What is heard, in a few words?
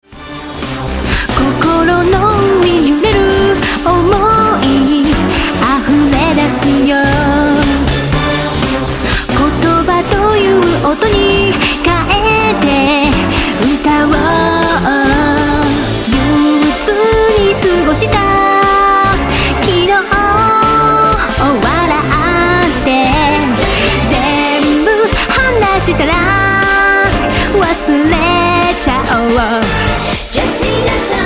vocal